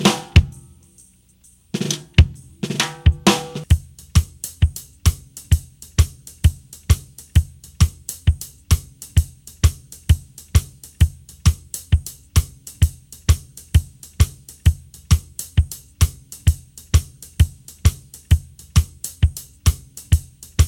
Batterie

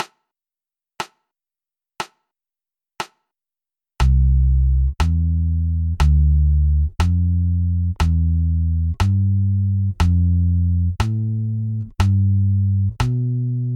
Tierces Gamme maj

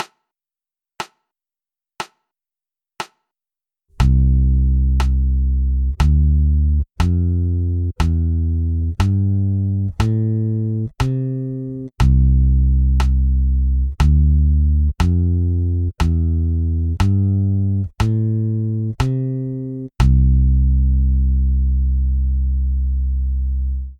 intervalle 3m Gamme Maj

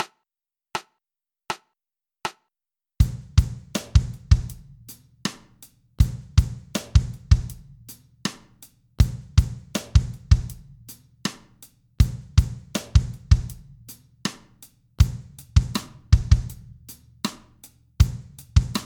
Précision Rythmique Batterie 80 Bpm

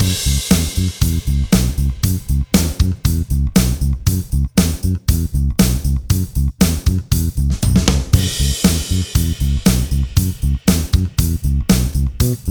Basse 118 bpm

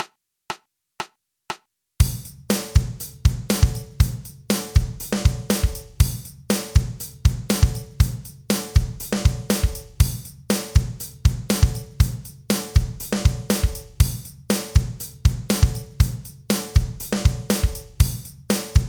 Batterie 120 Bpm

